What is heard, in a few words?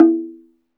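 SLIT DRUM E4 P; SLIT DRM1; Heart of Africa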